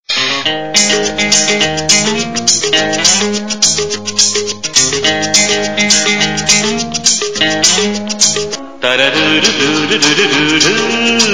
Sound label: instru; alternative